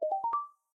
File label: Unlock